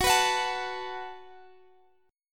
F sharp Minor Double Flat 5th, Guitar, Half Step Down